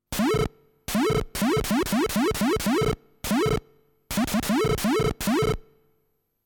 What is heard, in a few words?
Fire Magic SFX